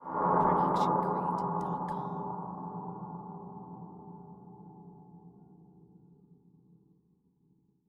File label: Ghost Hit; SoundsCrate